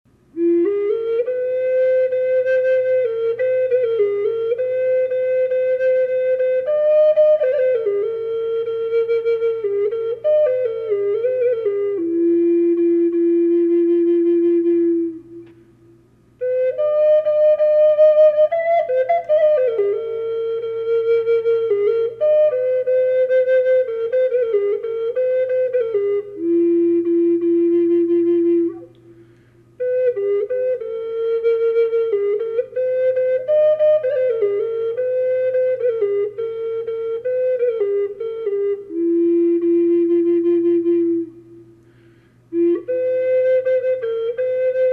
ambonya burl yellow reverb, flute; collector flutes

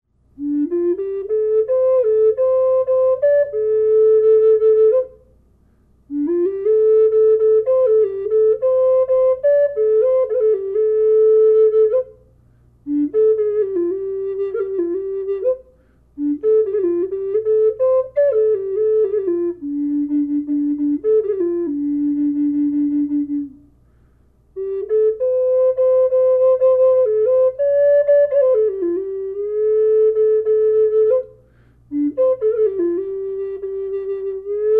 D flute; gray wolf; collector flutes

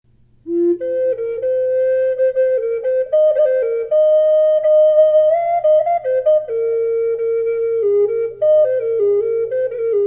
orca whale reverb minor flute; collector flutes

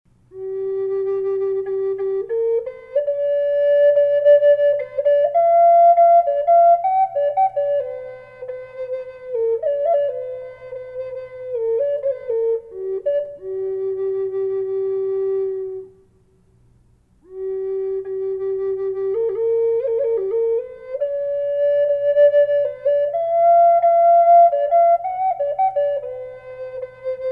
reverb flute; redwood burl; collector flutes

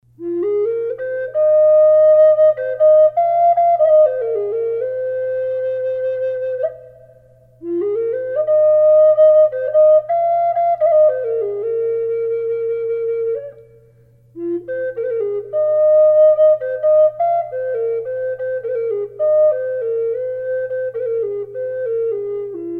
reverb f minor, flute; woodpecker; collector flutes

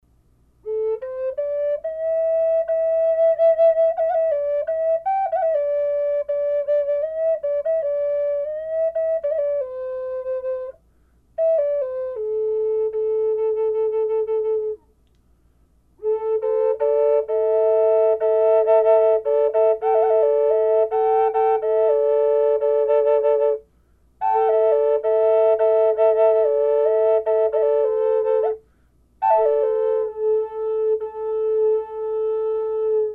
double flute a minor, Drone Flutes; Sample #1 no effect; Key of A minor; Heartsong Concert Drone and Double Flutes; Sedona

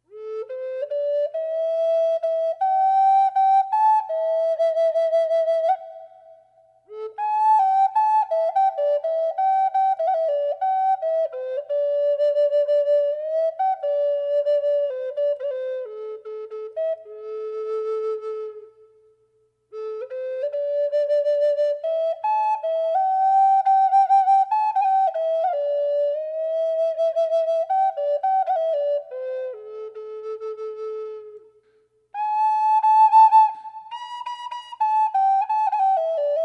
Peace love flute sound reverb

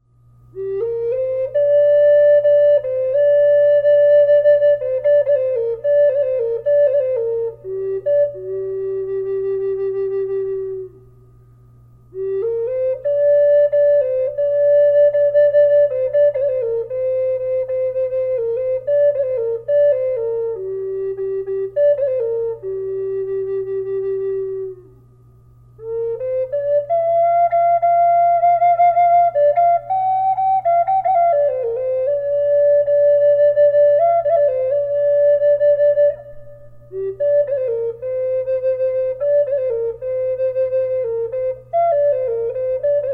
timber wolf reverb flute g minor; collector flutes

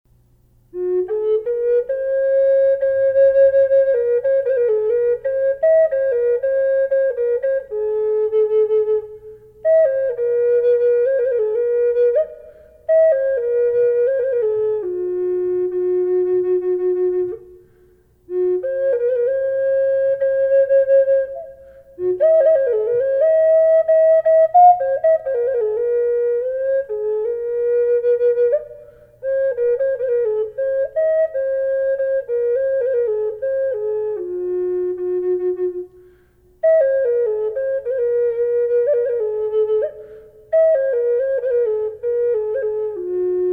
reverb flute; desert ironwood; dolphin; collector flutes

